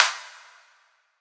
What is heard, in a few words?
clap snare